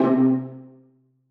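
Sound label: violas pizzicato c3; Sonatina Symphonic Orchestra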